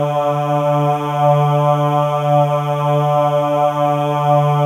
MALE AHS, Symphony of Voices; Spectrasonics

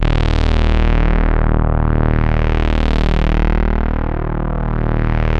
PULZE SWEEP, BASSES; Masterbits; Vintage Voltage

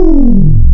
Descending Odd, wavetables; Animoog

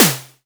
SERGE Snare Big, drums; Polyend; Stazma & Concrete Collage; Concrete Collage Drum Kit; Concrete-Serge Drums

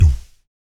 VOC PERC, Vocal Planet Jazz FX BASS PERC

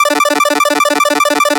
8Bit 150BPM 1 Bar Arp D; 8Bit Sounds; Antidote Advent

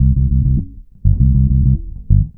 Organic Bass; Trip-Hop; Loops; Sonic Foundry (Sony Creative Software); Rondo Brothers Trip-Hop Loops & Samples; El Corazon

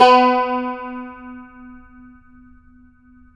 CUMBUS B, Guitars

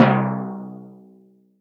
tim c3, CASIO FZ-1 Special FX; Retro Sampler

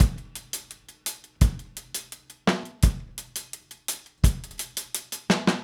Drums, 70s Analog Funk; Sonic Foundry (Sony Creative Software); What it Is! 70s Analog Funk

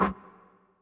scrub, Yamaha Tenori-On; Scrubkit

